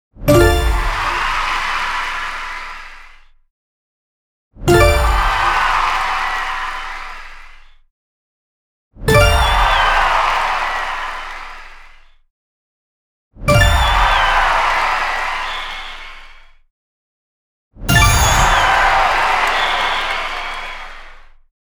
free Spin Sound; rugby Star Deluxe

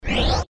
mileage collect; Skillzgaming; mega Money Rush Desktop

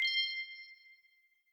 nebula rare; Skillzgaming; gems odyssey 92

